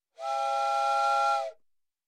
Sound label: Whistle, Steam steam Single Blast, Comedy; Sound Ideas 2000 Series